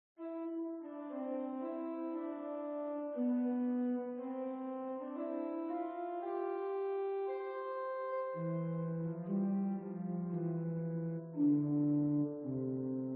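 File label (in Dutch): octaaf 4, orgel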